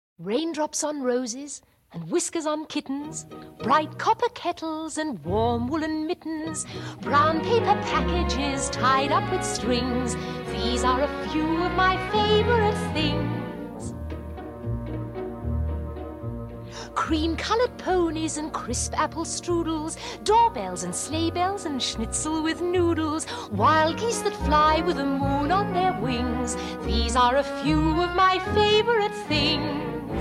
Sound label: Vocal Guide